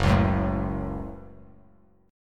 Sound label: Gb Minor Gb Bass Standard; strummed; JGuitar